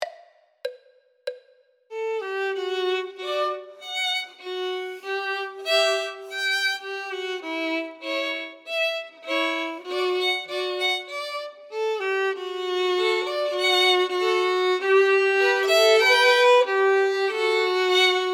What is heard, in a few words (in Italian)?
violino I e II